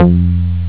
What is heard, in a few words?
acid bass; Amiga Soundtracker Sample Packs